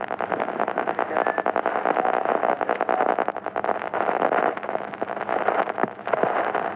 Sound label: radio station; ambient; World of Tanks Blitz; map amigosville